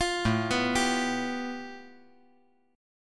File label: A 7th Suspended 2nd Sharp 5th; 10,9,7,10